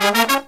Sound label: Killer Brass Riffs, 108 soul; USB Soundscan vol.29 - Killer Brass Riffs; AKAI